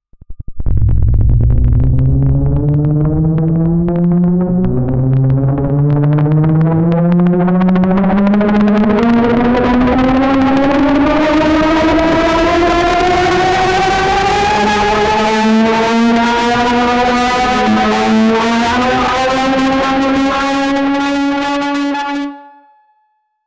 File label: create a number of risers, drill